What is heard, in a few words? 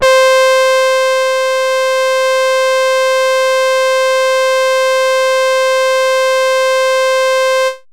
ewi saw synth